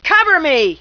attacked; svencoop; decay

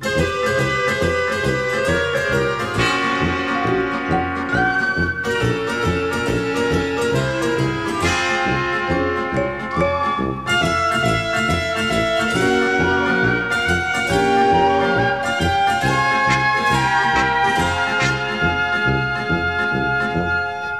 Italian Deli Music; fisk city

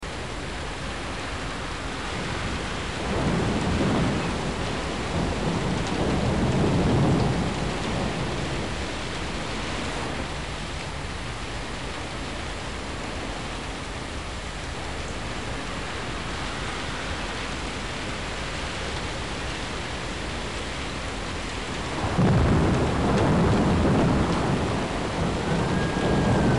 City Soundscape; fisk city

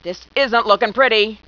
attacked; svencoop; decay

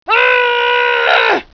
attack; svencoop; decay

